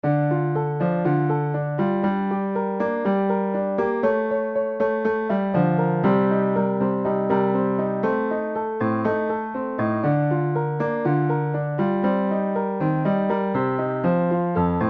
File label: piano; rien